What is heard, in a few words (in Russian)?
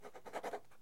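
роспись на важных документах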